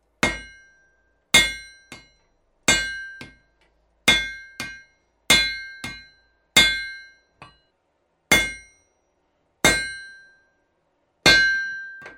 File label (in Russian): v kuznice